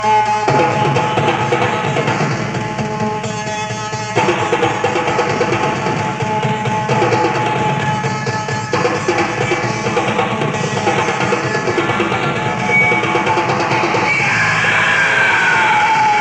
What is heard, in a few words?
Drum Solo; Live In Chicago; August 19, 1971